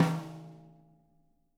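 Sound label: snare on mf, Living Drums; Sampleheads; Peter Erskine Living Drums; JZ XTOM 1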